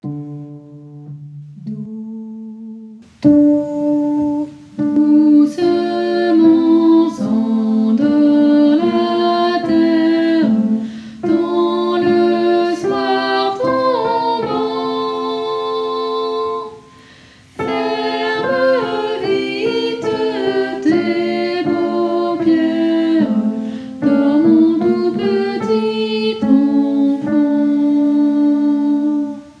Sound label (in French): berceuse cosaque voix séparées aigu mélodie